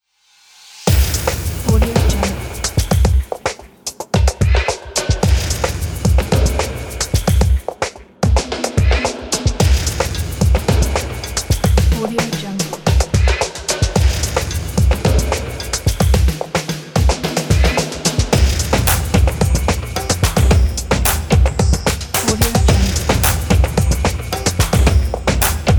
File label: industrial percussion background